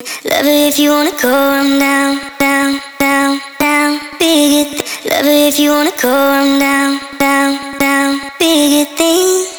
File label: Vocal Chop - 100 BPM D Maj; Cymatics; Euphoria Vocal Sample Pack